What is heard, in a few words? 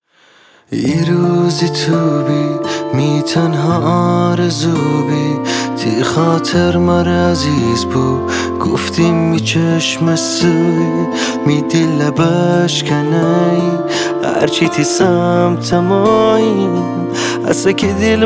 Acoustic Version